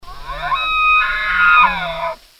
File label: elk